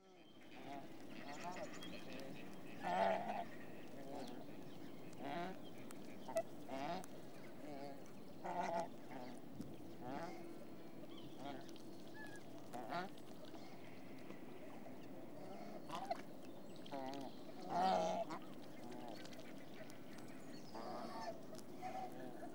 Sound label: Swan Geese; Animals and Birds; BBC Sound Effects Library